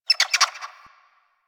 Bird Clicky; Warframe; Ambience; Eidolon Plains; One Offs

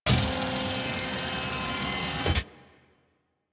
Mech Servo Slow; Nechro

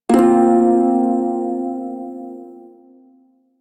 Prime Shawzin Hirajoshi Chord K; Music; Warframe

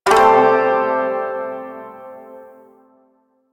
Shawzin Maj Chord; Music; Chords

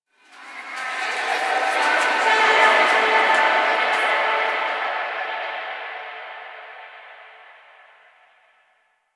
Memory Echoes; Warframe; Quests; TheNewWar; Ambience; Zariman

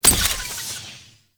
Glyph Prism Hit; Warframe; Duviri; Glyph Puzzle

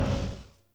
Scrap Container Loop Stop; Warframe; Missions; Zariman; Zariman Assassinate

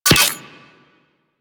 Guandao Pistol Projectile Attach; Warframe; Tenno

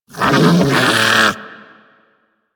Armadillo Vocal Snarl; Characters; Warframe; Hunting Critters

